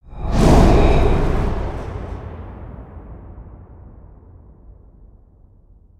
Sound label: Wind Leap, ambience spaceship; Starbase